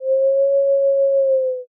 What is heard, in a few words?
erhu